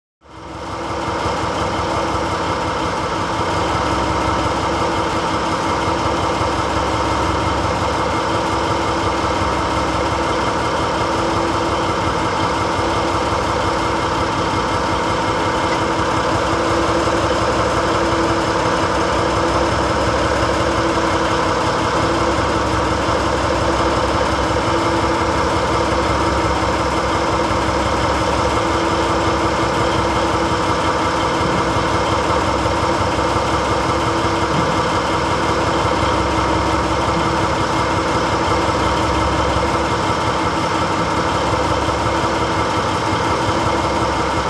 Boat with Engine; Run ( Ext. ); Constant Slow Engine.; powerboat